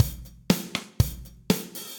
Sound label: Kit Studio Basic, Drums; MPE Kits; Ableton; Session Drums Studio